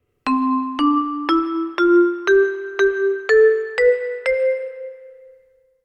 drietoonladder

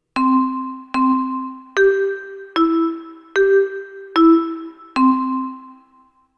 viertoonladder

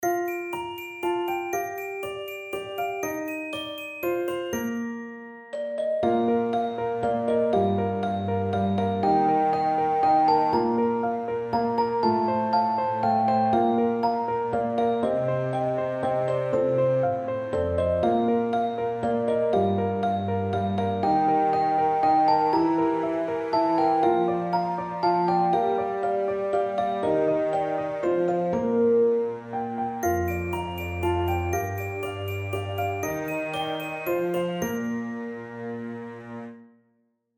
kleine baby zonder zang

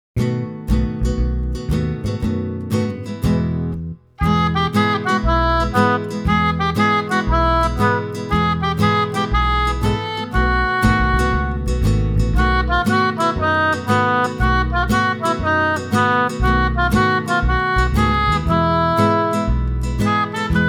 zonder zang

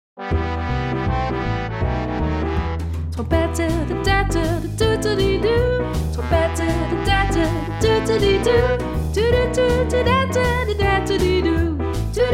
trompetter

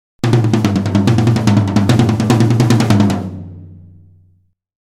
drumonderdelen

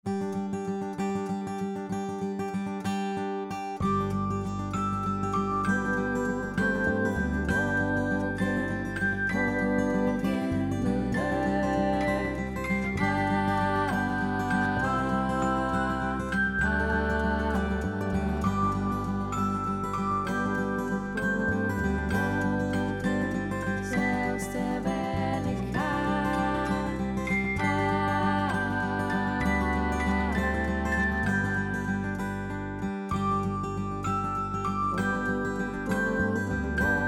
zonder zang

